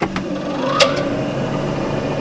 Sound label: motor start, bridge